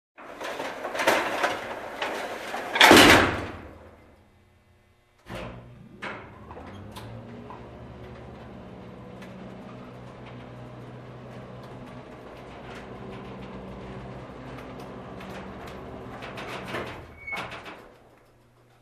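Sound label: sound of opening and closing of freight elevator doors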